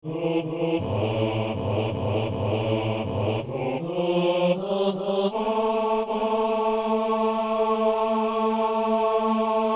psaume basse; Psaume 137 (138)

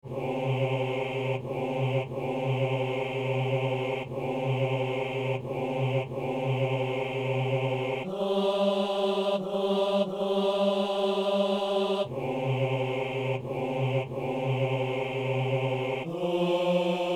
basse

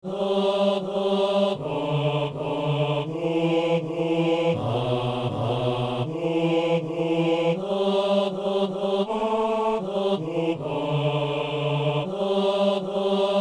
basse; Approchons-nous de la table

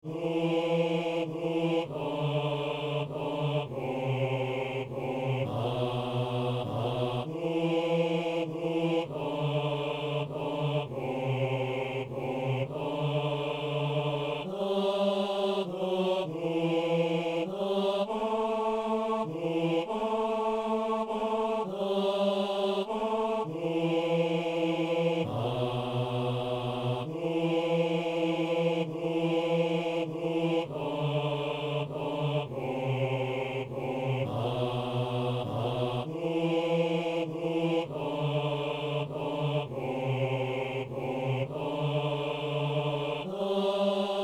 une voix parcourt la terre, basse

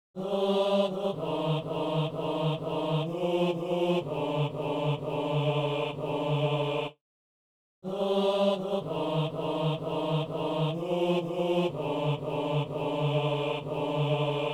basse; Messe de San Lorenzo - Gloria

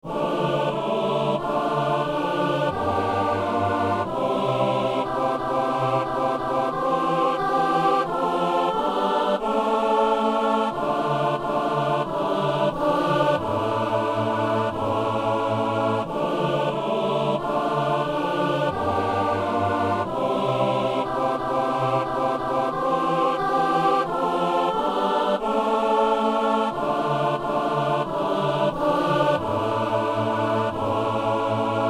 messe agnus; messe de saint jean

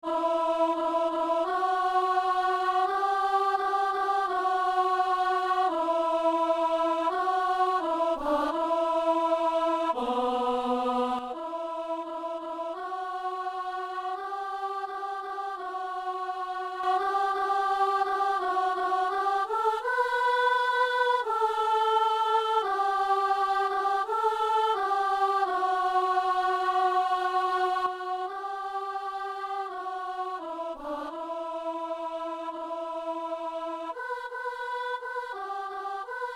soliste; psaume

